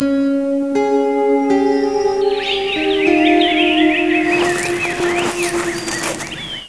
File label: opening theme